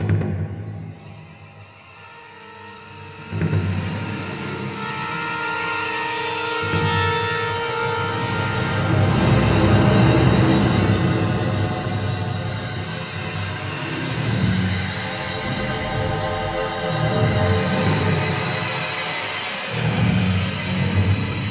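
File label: suspense loop; Star Wars Bounty Hunter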